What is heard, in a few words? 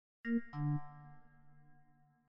export failed, notice sound